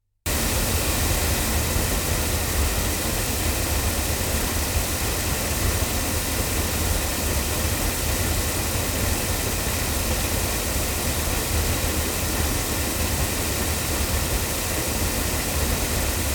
ADK A51TL cardioid loudspeakers

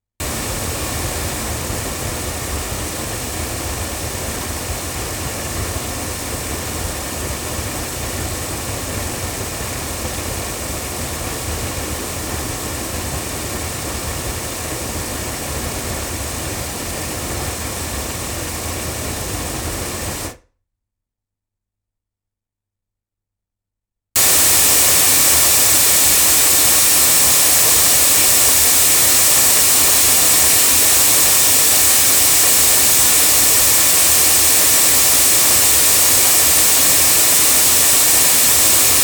Oktava MK012 hypercardioid loudspeakers

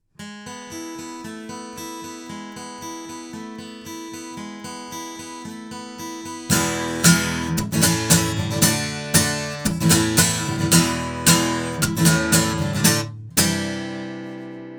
sE 2A omni guitar, microphones; se electronics

